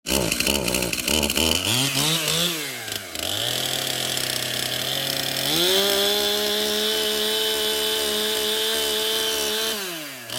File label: Chainsaw